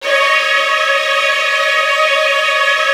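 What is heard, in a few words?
violin trills C#1; ILIO; Kirk Hunter Virtuoso Strings VOL-1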